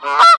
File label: Animals - Goose